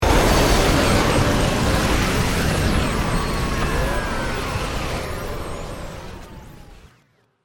Stinger Swirl, Production, Element, Stinger, Swirl, Descend; BlastWave